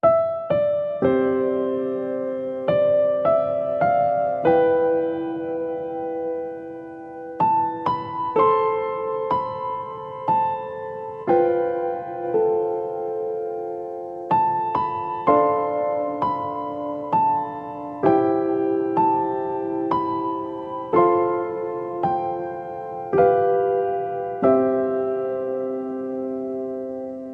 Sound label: The First Noel, Piano